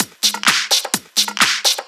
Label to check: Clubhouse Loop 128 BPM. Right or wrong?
Right